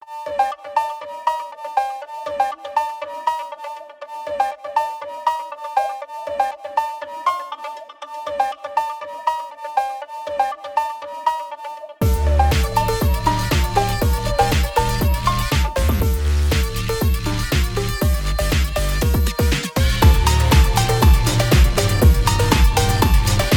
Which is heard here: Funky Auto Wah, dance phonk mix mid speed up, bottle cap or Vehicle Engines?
dance phonk mix mid speed up